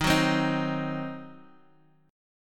D sharp Minor 6th D sharp, down, Guitar; D#m6; strummed; 4E,4A,5D,5G,5C,6F; chord shape

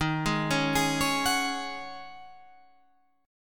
Db Suspended 2nd Suspended 4th Eb

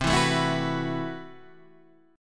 A Minor 6th Add 9th C, Chord

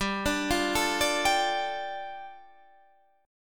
G 7th Suspended 2nd G; down

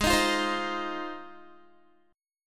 G sharp 6th G sharp down Guitar, chord; G#6; strummed

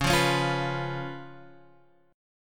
Db 7th Db down Guitar Open G

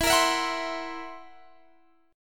Fsharp 7th Flat 5th, guitar chord

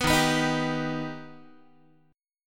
Eb Augmented Eb, Guitar; strummed; JGuitar

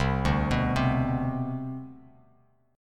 C Major 7th Suspended 2nd, C, down, Bass Standard; arpeggiated; CM7sus2

